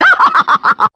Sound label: laugh